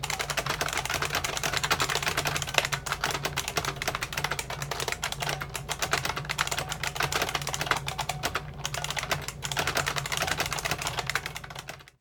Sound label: Typing, Model M